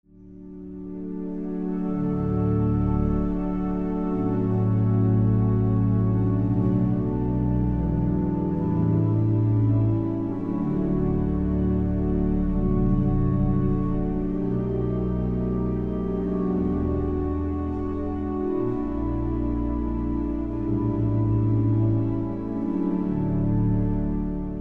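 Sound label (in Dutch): Psalm, orgel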